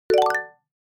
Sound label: Alert notification